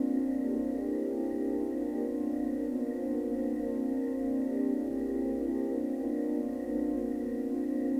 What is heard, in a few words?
Drone Ambient; Drums & Drones